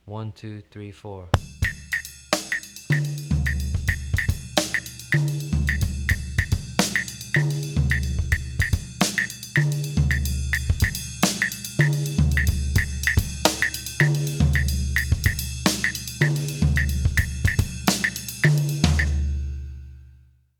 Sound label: mozambique variations